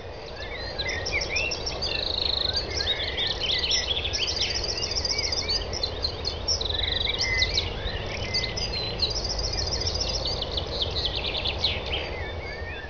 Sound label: dawn